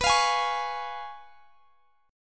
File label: Db Major B; Db/B; strummed